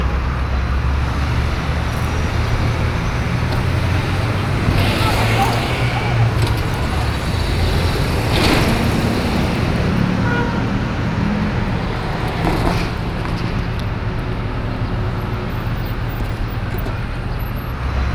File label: street traffic, ambience